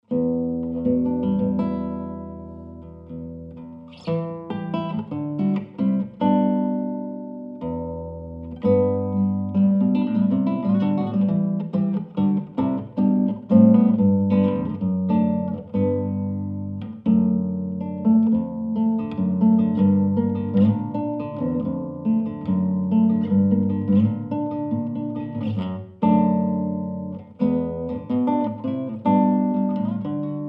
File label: nylonstring